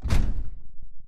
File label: atlas footstep sprint; Titanfall 2